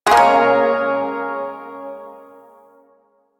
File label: Shawzin Yo Chord; Music; Chords